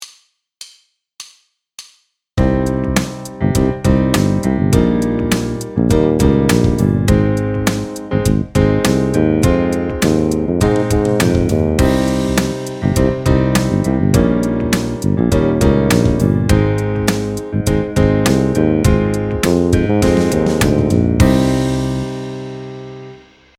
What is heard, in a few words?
Cm Ebbb Ab